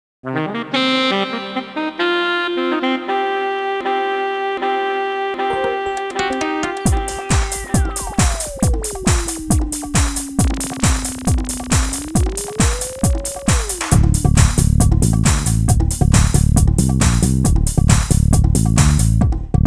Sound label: Bonus; pop n music 2; dreamcast